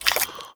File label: breath in, headcrab black; creatures